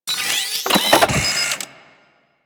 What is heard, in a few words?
Camera Consumable Equip; Warframe